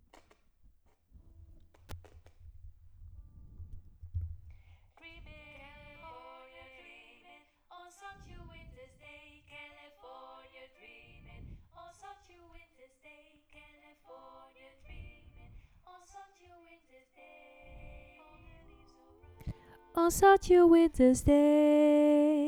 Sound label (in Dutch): nieuw einde Solo